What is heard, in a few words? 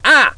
ahh; beavis; tennis